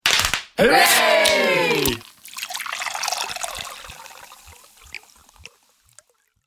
Party Friends, Group voices shouting 'Hooray' with party poppers and drinks pouring.; Holidays